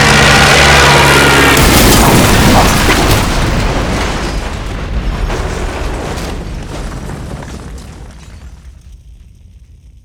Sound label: stuka crash; vehicle; school; mohaa